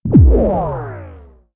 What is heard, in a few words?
shield hit; Electricity Game Sound Pack